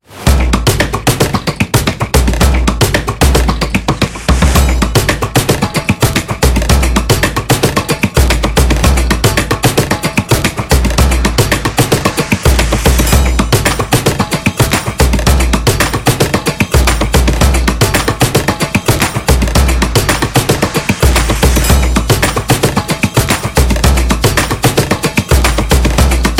Tribal Beat in Jungle; amazon drums

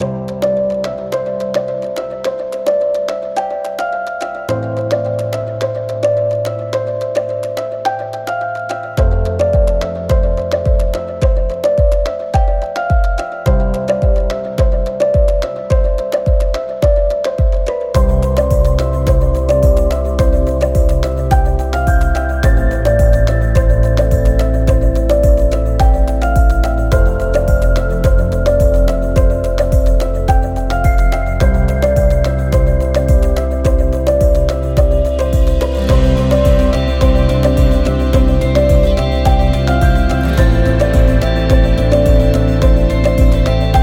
Easy Corporate; service business

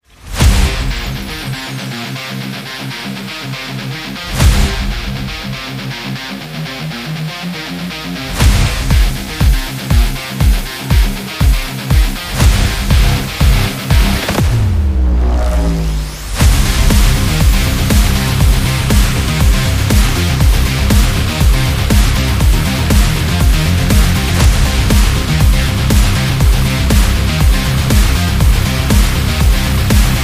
Sport Rock Action

